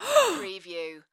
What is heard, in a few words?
Gasp Female